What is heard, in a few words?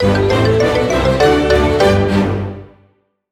Short Cartoon Logo